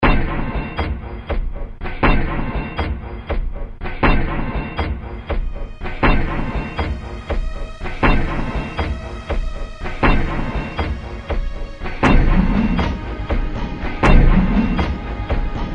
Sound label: music